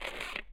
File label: jump, footsteps, cement; chief